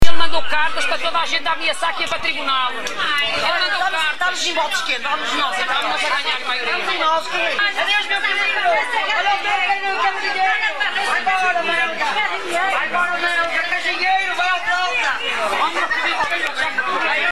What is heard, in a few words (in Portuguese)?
gritaria